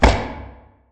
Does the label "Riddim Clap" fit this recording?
No